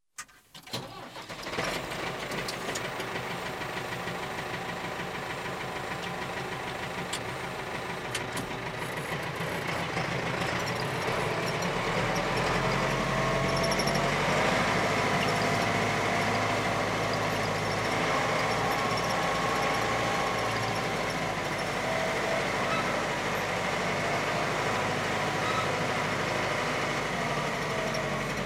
Ford 7610 Tractor, Farm Machinery; The BBC Sound Effects Library

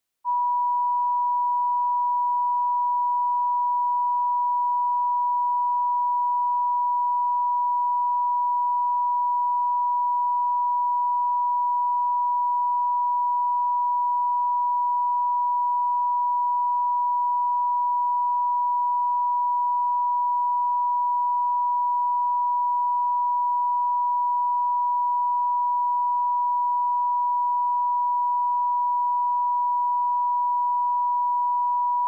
line up tone; The BBC Sound Effects Library; Interior Backgrounds

